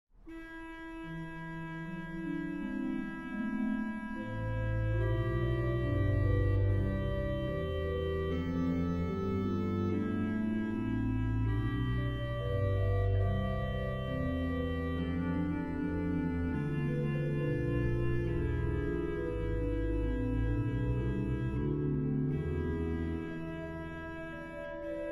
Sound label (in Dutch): Aria (2 orgels); Psalm 51